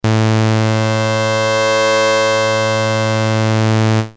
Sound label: sync, nord